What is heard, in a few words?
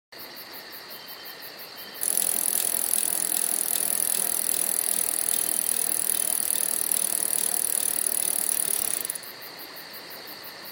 Jingle